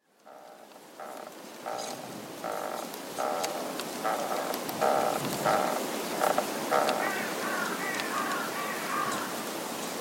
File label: creaking elder tree